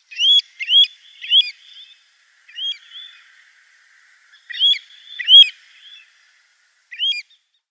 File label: Pacific Golden-Plover flock calls New Zealand